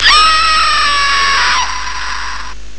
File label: women scream